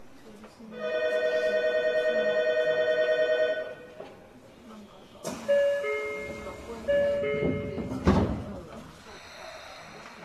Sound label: vvvf, mitsubishi